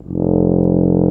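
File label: brass tuba; Roland L-CDX-03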